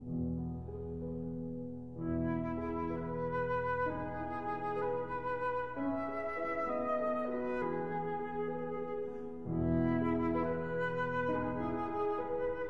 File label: Berceuse (Lullaby) for flute and piano